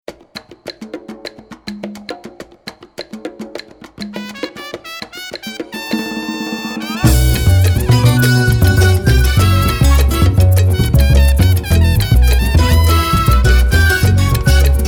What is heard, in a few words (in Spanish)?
Al ritmo del Son